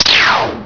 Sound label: gun; asciisec